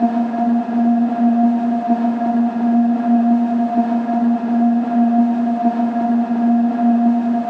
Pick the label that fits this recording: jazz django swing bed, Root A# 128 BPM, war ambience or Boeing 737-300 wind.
Root A# 128 BPM